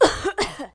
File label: Woman Idle; In the Line of Duty Firefighter